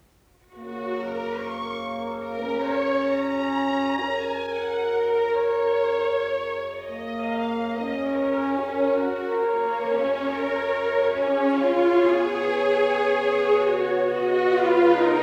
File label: Music Example (Orchestra)